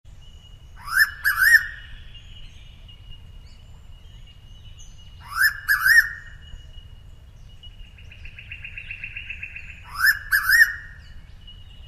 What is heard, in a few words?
pitta versicolor; kurtaczków hałaśliwych; ptaki